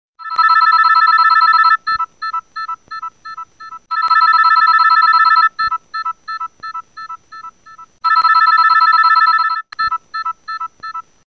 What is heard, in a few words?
Clock Alarm Classic